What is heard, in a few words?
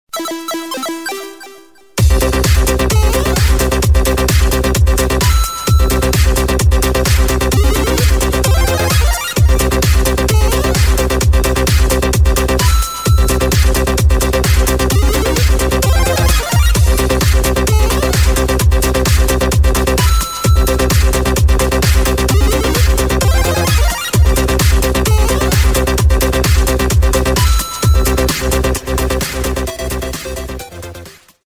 super mario dendy 8bit games remix